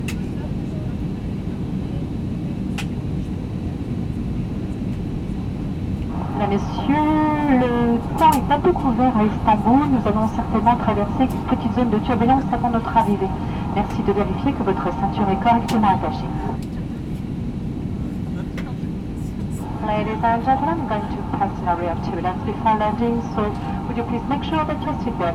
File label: turbulences